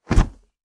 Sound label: whoosh cloth leather fight